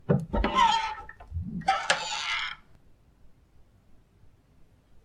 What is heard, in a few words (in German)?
tuerklinke